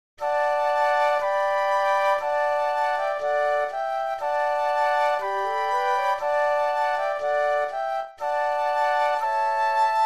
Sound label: Flute Quartet; christmas